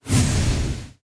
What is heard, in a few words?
win sweep Vertical; wild worlds